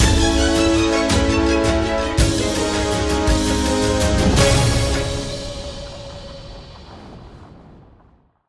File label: freespin Outro; shangrila